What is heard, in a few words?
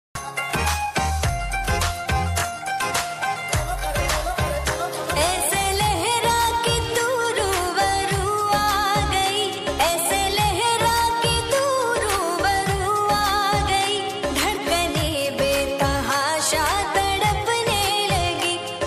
Romantic Version Song Ringtone